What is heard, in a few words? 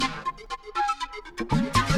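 Music Dpad Base 120; From Dust; ps3; xbox 360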